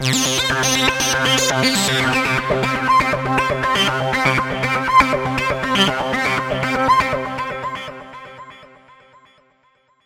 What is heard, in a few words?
tecno